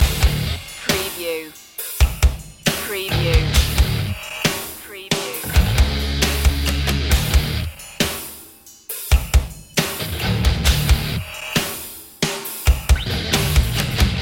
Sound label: monster machine loop